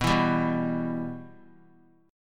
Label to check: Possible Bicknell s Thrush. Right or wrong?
Wrong